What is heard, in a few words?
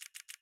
camera shutter; Coolpad ivvi K1 mini